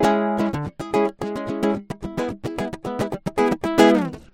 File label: uke